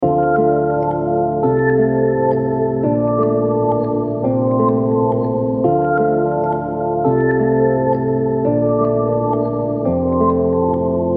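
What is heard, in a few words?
D minor 171 BPM Keys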